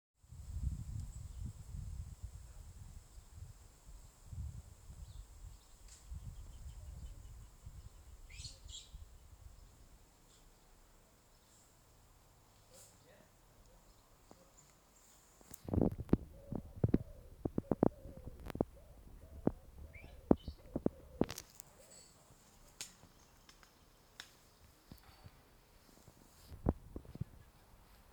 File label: Bird sound